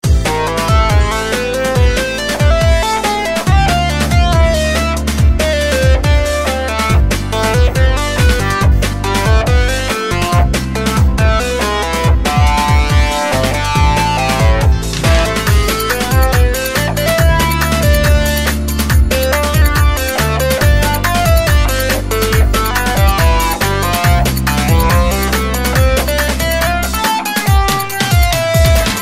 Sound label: Guitar Solo Oriant Beat